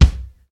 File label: thick hip hop bass drum sound c key